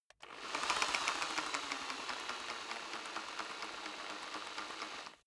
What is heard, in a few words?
rock drill hammer rev very long soft; maschinen; bohrer; soundpool